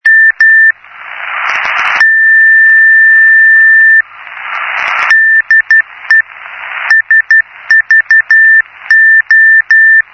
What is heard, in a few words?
cw rtty